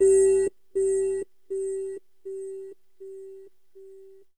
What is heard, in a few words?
siren